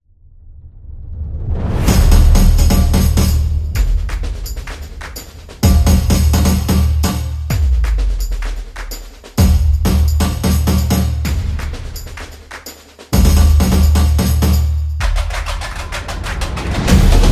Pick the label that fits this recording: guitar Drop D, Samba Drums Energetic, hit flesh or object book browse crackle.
Samba Drums Energetic